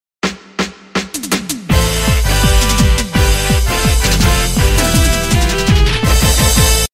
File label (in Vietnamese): nhac chao mc organ